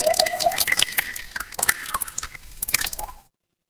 water drip

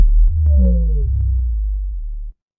transition sweep

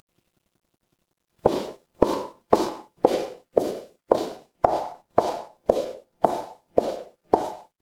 footsteps concrete

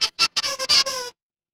cartoon squeak

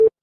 ui beep

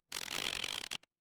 cartoon stretch

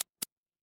office mouse click

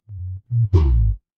feedback confirm

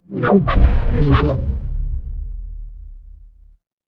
magic scifi portal

